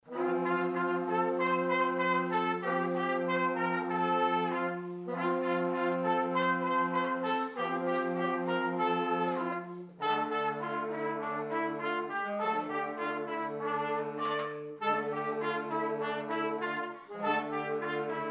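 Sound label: Junior Brass Variations on Ukrainian Folk Song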